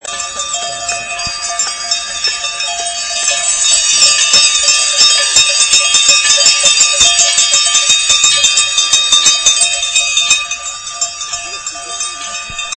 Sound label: Olympic bells at Hythe